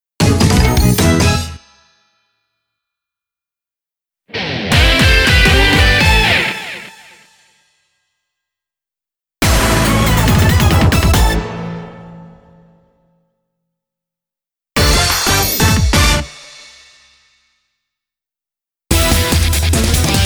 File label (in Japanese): ジングル集; マジカルハロウィン4; magical halloween4 original soundtrack